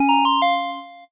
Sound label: 8bit se